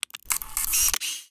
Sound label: micro robot